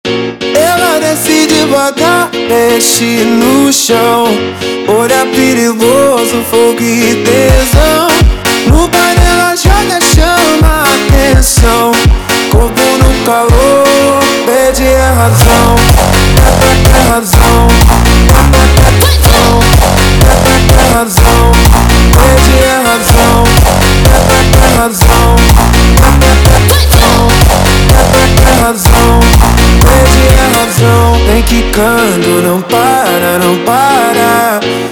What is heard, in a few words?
Montagem slowed